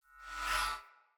Great Sword Whoosh Accent; Weapons; Player; Swords; Mendicant Great Sword

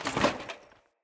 Foley Action Armour Melee Heavy Swipe; Player; Deora Armour

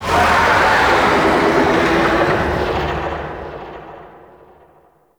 Distant Oni Vocal

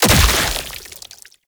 Dismember Gore Impact; Warframe; Weapons; Bullet Impacts

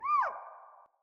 Fawn Vocal Call; Echo Young Deora

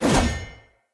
Player Sphere Polearm Whoosh

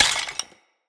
Vase Clay Tiny; Vases

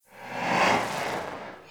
Doom Sword Idle Whoosh; Excalibur

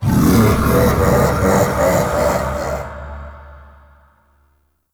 Doppelganger Laugh; Enemies; MITW; Warframe; Entrati Doppelganger

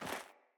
Sandals Run Snow, Footsteps

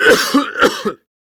Coughing; PTSD; Warframe; Evacuation

